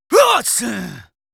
Yells, Viking Vocal

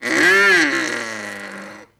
Bronx Cheer; Vintage Cartoon; Comedy Vocals

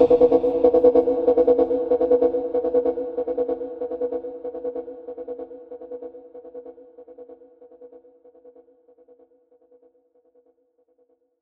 Conga 142 BPM; Psytrance; Ethno Box; Conga Wuwu

